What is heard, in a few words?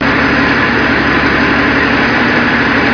Dm11 idle; finnish